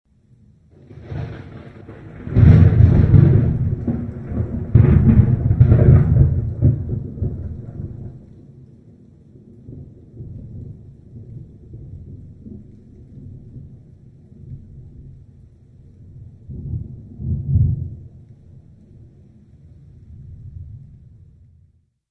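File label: zvuki molnii, lightning sounds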